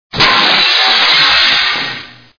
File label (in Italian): Vetri rotti; Effetti sonori